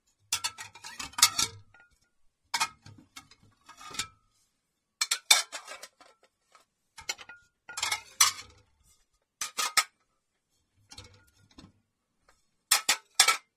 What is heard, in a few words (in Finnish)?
lautaset